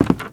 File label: STEPS Wood Creaky Walk; UltimateFishing